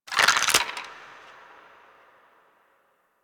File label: Bone Break; Ambience; Golden Maw; Warframe; The War Within